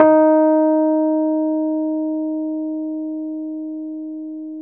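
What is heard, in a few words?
RHODES E flat 3, electric piano; SYNTH KEYS ETCETERA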